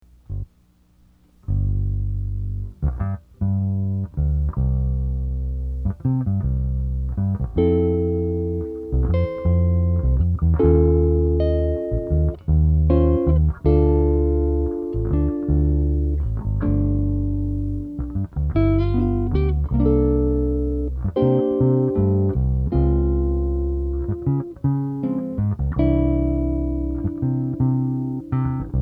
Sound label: its late again guitar bass